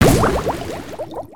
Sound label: Water Charge; Buccaneer